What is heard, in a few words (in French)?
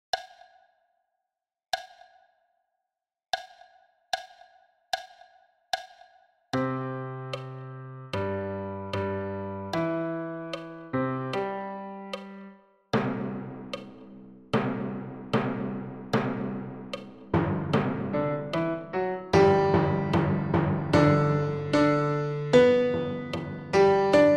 piano timbales a 75 bpm